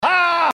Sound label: SINISTER MANIACAL LAUGH; Kenneth Copeland; Evangelist Crook